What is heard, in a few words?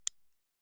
Pistol Safety; SoundsCrate